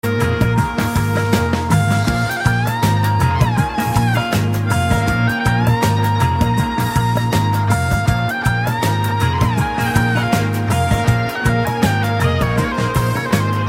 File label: Flute And Guitar